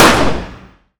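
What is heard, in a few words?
fire distant 45acp pistol interior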